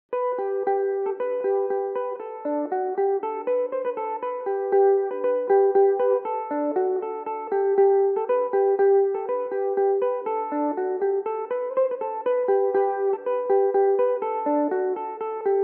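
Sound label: G major, Irish mandolin; The Other Clog; Belmuse EM-190 electric mandolin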